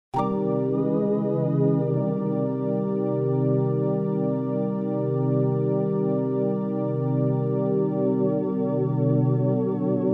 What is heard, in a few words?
background sound with pitch bend